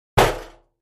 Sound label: Garbage Can Metal Imp; barrels buckets & cans